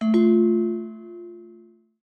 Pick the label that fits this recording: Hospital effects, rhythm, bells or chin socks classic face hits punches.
bells